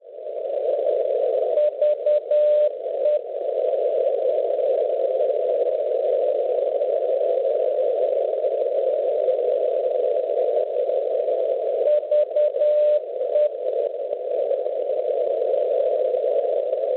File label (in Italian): VE NDB Valence Francia 320 kHz; Schwarzsee